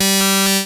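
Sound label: sample and hold G1; Best Service Dream Experience; S+HOLD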